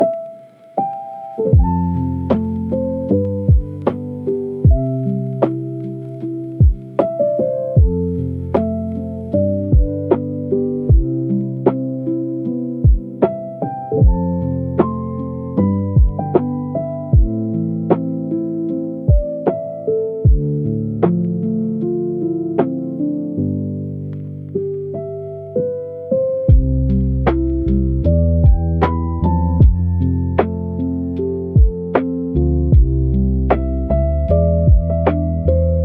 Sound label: emoi lofi piano for recall and nostalgia